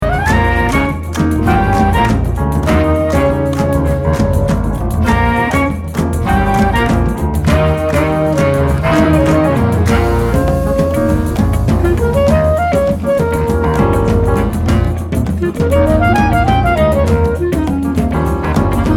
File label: batucada jazz